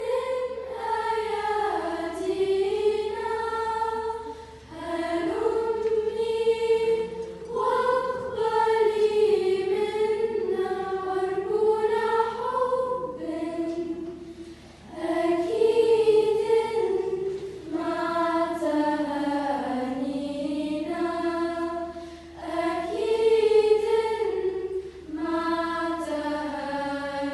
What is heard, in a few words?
Chorale; CCSJ; Annonciation